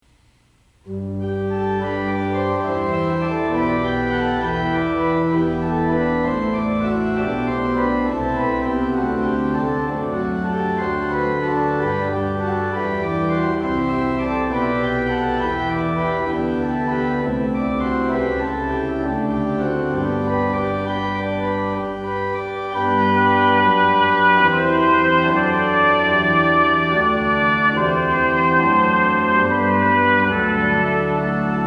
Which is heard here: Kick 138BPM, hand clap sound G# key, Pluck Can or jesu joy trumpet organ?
jesu joy trumpet organ